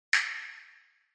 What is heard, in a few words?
Castanets